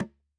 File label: dhi, mrid